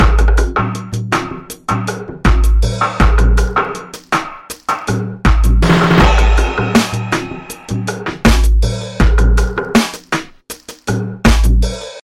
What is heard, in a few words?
Trip Hop Woodpecker Beat